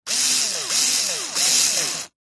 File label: industrial electric cordless screw driver or drill 3x short bursts; zapsplat